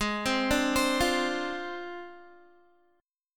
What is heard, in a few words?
Ab 6th Flat 5th down Guitar; arpeggiated